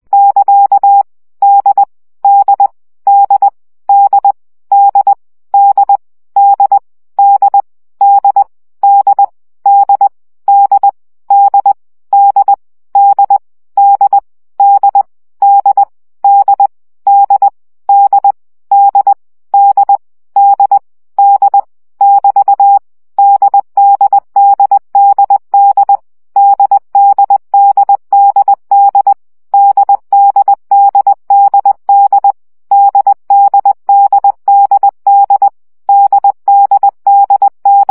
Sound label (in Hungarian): CW gyakorlas d 7 baud